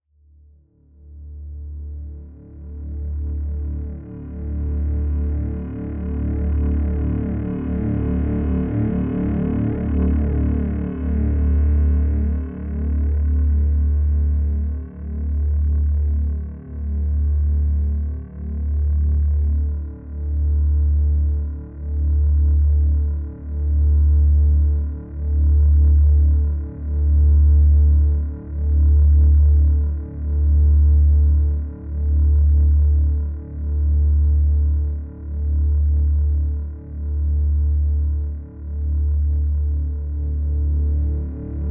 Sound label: choir fade